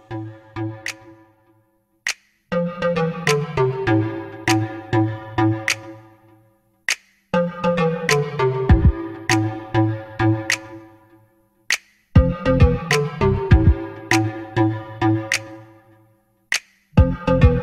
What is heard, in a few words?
Remix; Vivo Y17 New